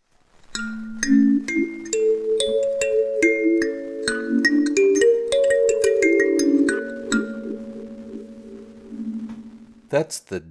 Olive Tuning, kalimba; Olive-9 Kalimba; Bebey A7 Tuning – An African Mixolydian Pentatonic Tuning; Magadi Olive-9 Kalimba

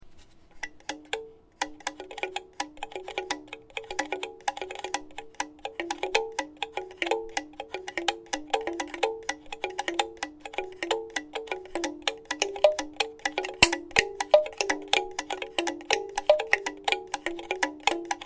Bamboo Kalimba

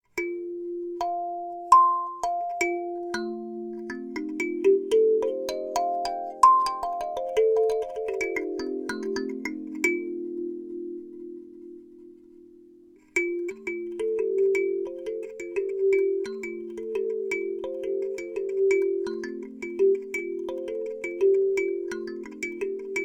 Box Penta F7, kalimba; Bebey

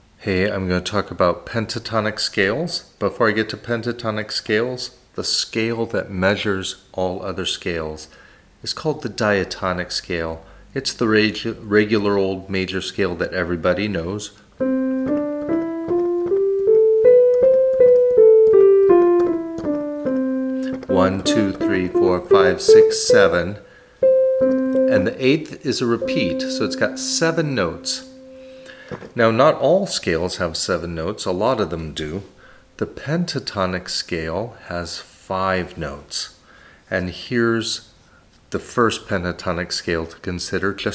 Pentatonic Scales, kalimba

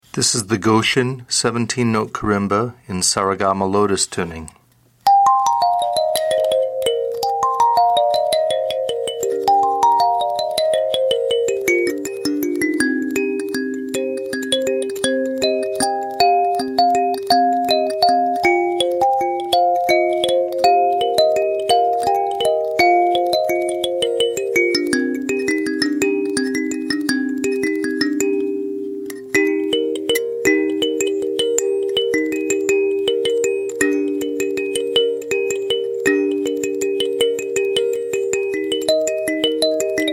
karimba lotus; goshen

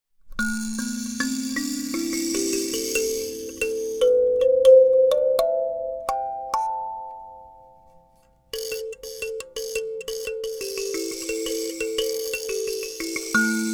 Lotus Box Am Em Buzz, kalimba

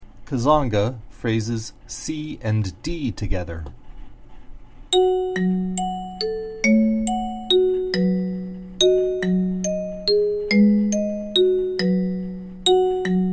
Kuzanga Prog C+D, kalimba

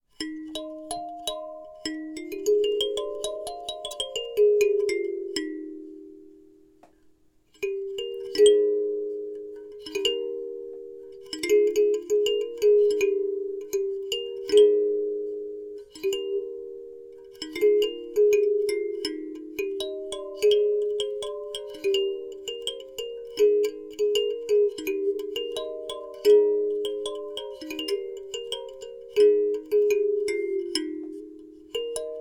Min 9 Eb Major, kalimba

